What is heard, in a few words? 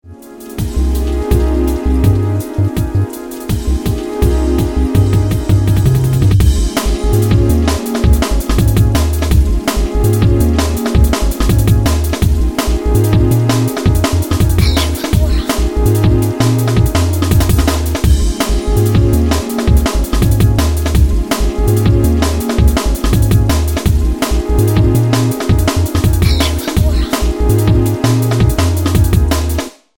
Electrobass; AloTones